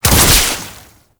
Web Tangle Shot; Boss; Enemies; Abilities; Warframe; Oraxia

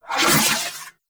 Proj Hip Whoosh; Weapons; Warframe; TheNewWar; Omicrus

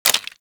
Bullet Impact Debris Wood; Warframe; Weapons; Bullet Impacts

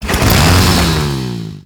Saw Accent Rev; melee; weapons; Warframe; Grn Ghoul Saw Player; Kuva Ghoul Saw

